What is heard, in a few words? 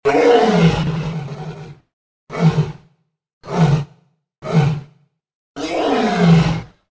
lion